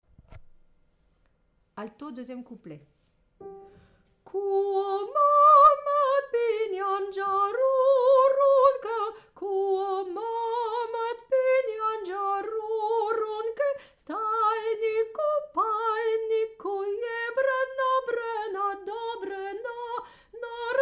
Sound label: Alto